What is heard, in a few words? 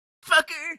car reaction; Blind Drive